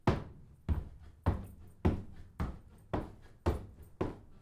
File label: Running